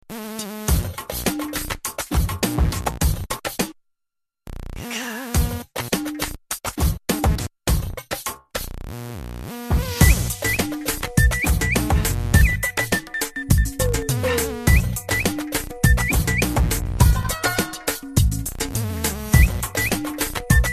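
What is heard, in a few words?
arabic happy instrumental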